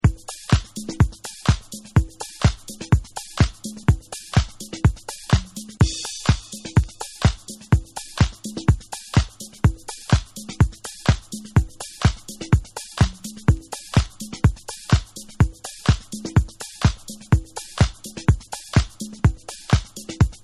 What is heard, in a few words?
Beats